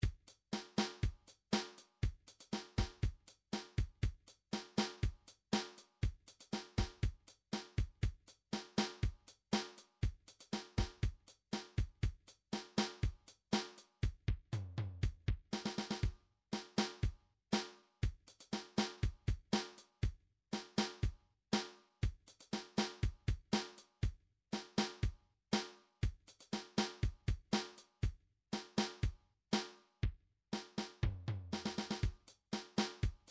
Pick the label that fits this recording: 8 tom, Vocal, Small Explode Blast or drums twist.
drums twist